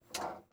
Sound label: Brake, Train; LT1996; OpenBVE